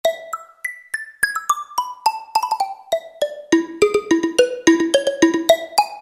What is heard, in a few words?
Xylophone; Roland PN-D50-02